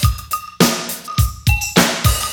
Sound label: U-D LOOP, breakbeats; Crypton - DrumBase5000; BREAKBEATS#4